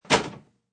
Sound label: Thin Door Shut, Thin door slammed shut; Door Closing